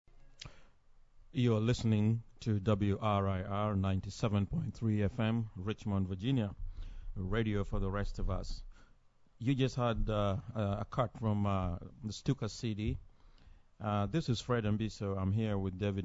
Ambiance Congo

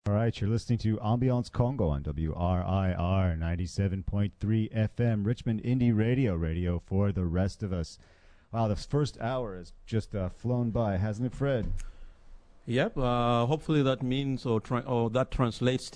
Ambiance Congo

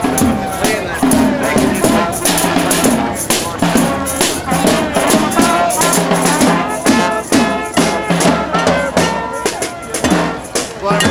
bandmusic

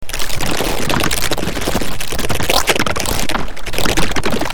Crow; Spotch

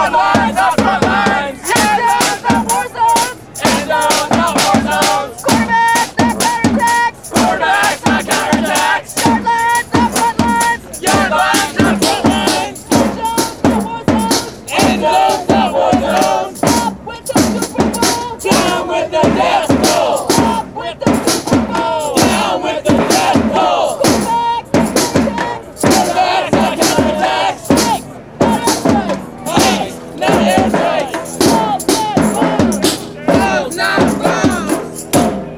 lead chants; tta and andalusia

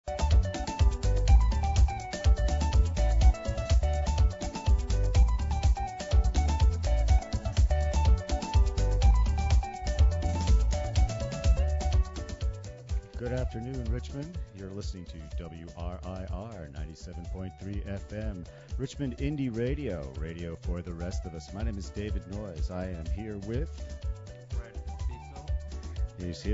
Ambiance Congo

